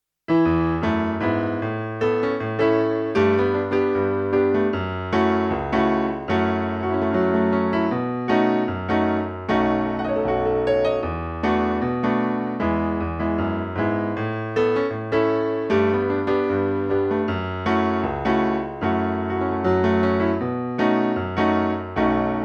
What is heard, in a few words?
Song Solo Performance Track No Bass